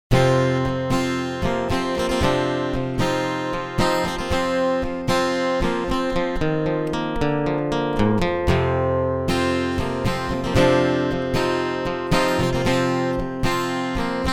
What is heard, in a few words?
Piezo mic solo